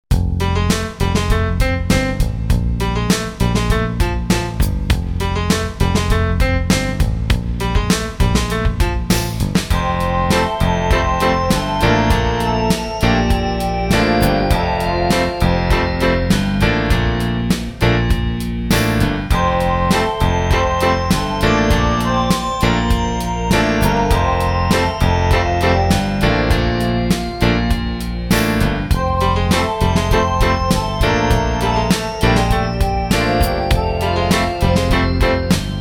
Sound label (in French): VERSION KARAOKE; Alex et Zoé 3